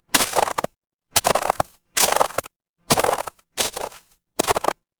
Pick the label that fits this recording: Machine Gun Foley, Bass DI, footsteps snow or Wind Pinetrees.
footsteps snow